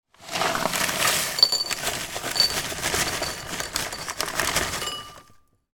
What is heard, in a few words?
food shredded wheat cereal poured from pack into bowl